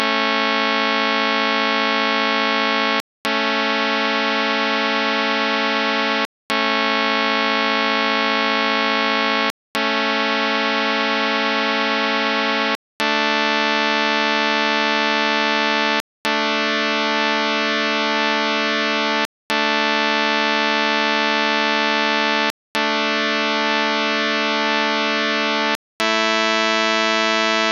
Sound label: scale